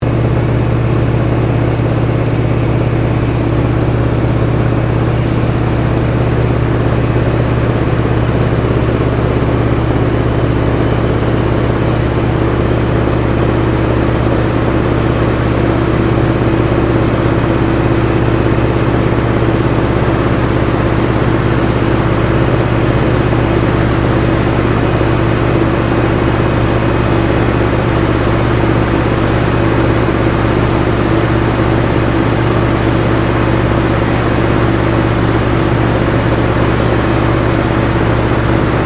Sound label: AT-6D HARVARD MK III FLYING INTERIOR; Harvard Training Aricraft; Airplanes; Vehicles; Windrush